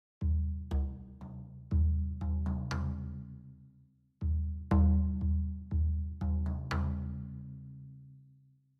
Music Drums; Citadel Music Drums; Frozen Flame; Windows

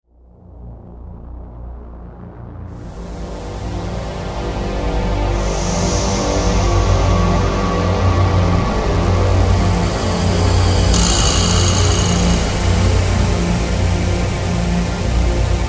slow fade stinger; Scarface: The World Is Yours

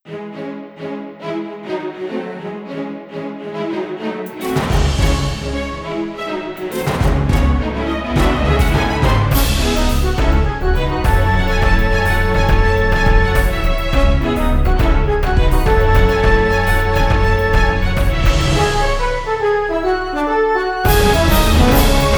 Battle Forest; Valhalla Knights 2

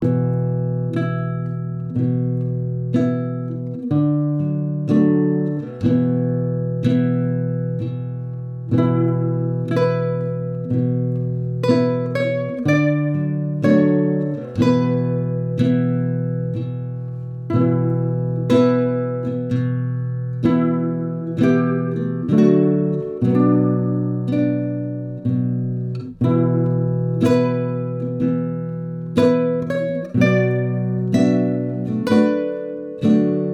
Bard Instrumental Loop

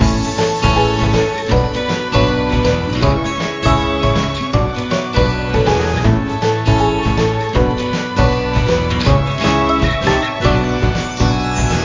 bgm shop main; Android game music

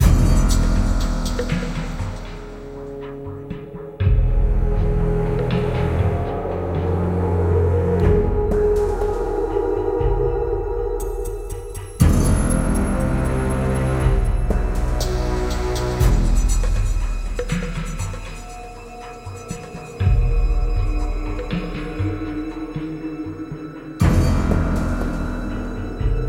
Tension Alt; Damnation

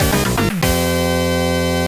Professional Jingle; superlite 2500 custom mahjong

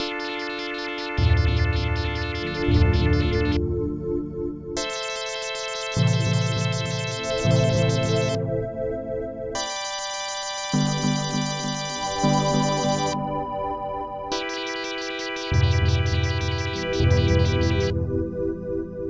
Long Hallway - Electro

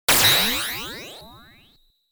Opening Explosion Sound; oretachi game center zoku quarth 2006

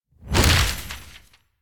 Confetti; Angry Birds

